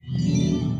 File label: swap; john carter; lost symbol